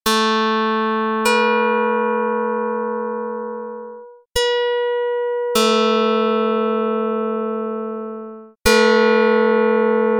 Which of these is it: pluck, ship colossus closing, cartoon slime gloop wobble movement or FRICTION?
pluck